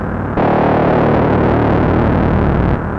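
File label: monotron delay - Fm - 85, Loudest frequency 507 Hz Recorded with monotron delay and monotron - analogue ribbon synth; synth loops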